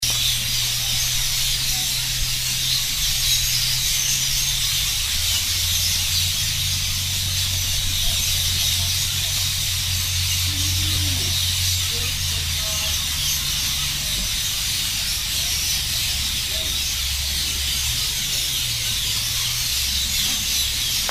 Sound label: Bats Going Crazy In Cairns